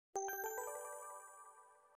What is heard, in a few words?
love alarm